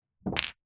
sport pool ball pit into net with other balls inside